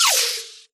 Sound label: imp bullet generic titanshield; Titanfall 2